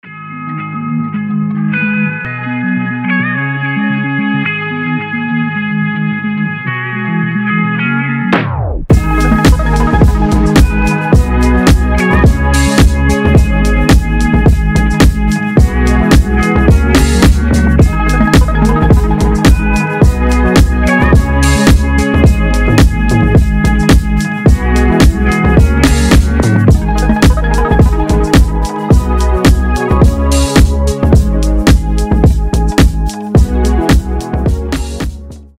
butterfly beat

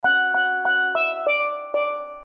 happy happy happy steel pan

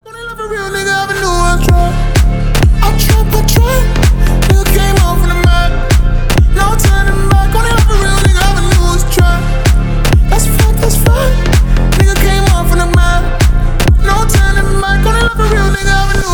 trap remix

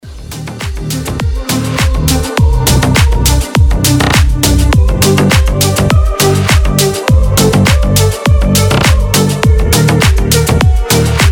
techno

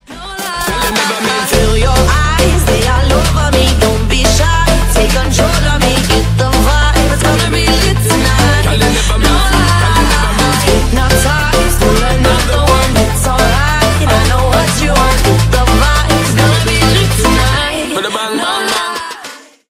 dancehall remix

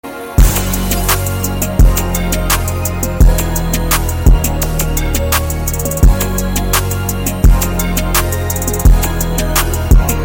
type beat

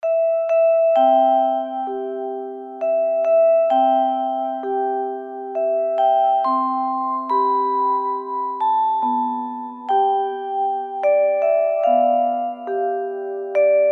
go to sleep instrumental baby lullaby

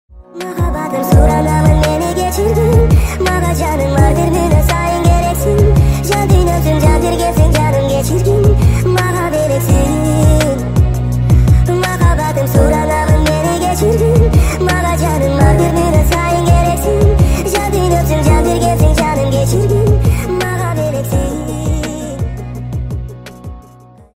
speed up, TikTok speed up remix

